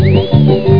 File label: whistle